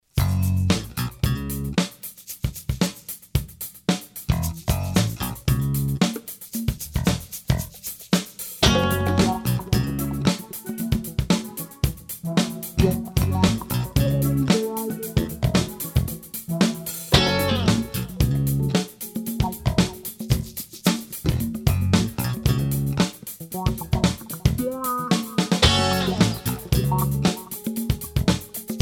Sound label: Tangled Strings